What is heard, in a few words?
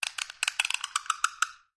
Thumb Screw Turns, gears